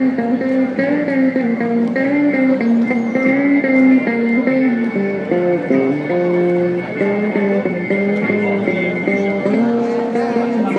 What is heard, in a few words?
twangy guitar busker with hammond backing; on market st manchester